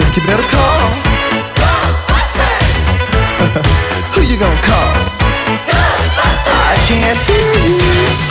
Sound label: Vocals - Chorus Vox; 3rd Chorus Vox Shortb